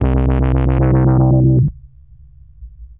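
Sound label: synth bass, drum and bass, 160 bpm, C; eJay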